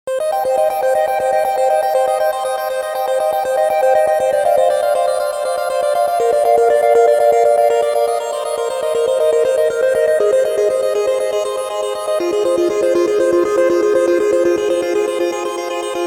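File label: Circuit Synth Arp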